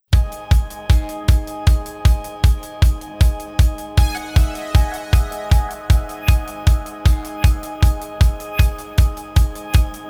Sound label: Kick